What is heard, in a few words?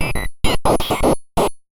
Radio Relay; martyn stonehouse